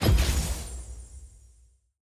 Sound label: lockin button click; champ select